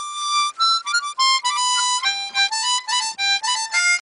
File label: Harmonica